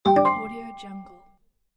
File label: phone message